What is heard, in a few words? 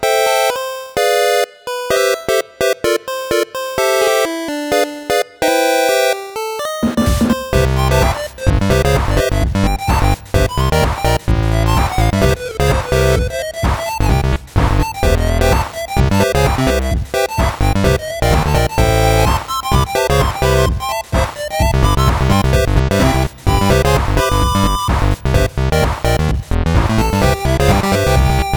nightjazz